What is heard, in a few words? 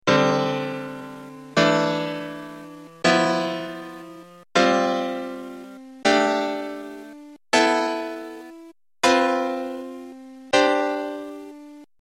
stacked fourths